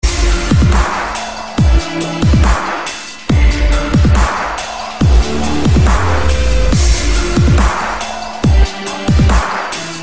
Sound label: Saw Dubstep